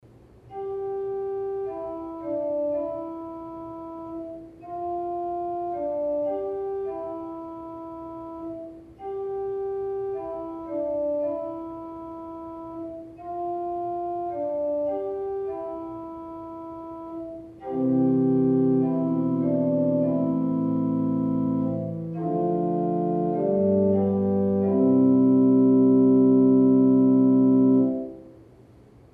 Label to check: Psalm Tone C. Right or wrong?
Right